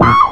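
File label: saxophone, BRASS KIT; Big Beat Samples & More; AKAI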